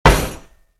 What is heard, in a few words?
Wood hit wood heavy, Wood, Impact: Wood, Medium; Metallic Ring; Materials